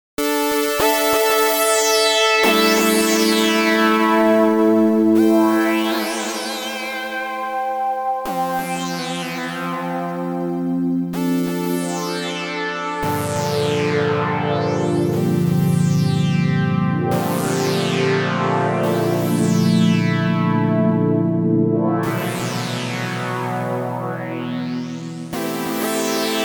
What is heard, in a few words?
Objeq Delay